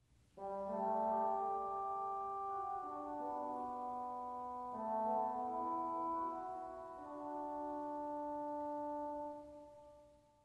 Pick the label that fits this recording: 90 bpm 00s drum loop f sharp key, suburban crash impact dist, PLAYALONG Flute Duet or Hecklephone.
Hecklephone